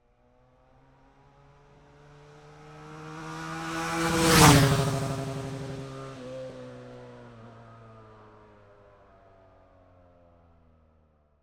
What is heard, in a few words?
BMW F800R EXTERIOR Pass By Fast Accelerating, 4-Stroke 798cc; 2010; Ruptor ORTF; Dramatic Cat